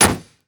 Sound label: Hit Metal; Damage